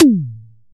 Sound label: Rx7 Hitom, Percussion Hits